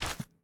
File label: step